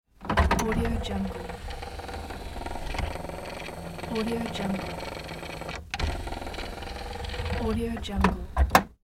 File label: vhs player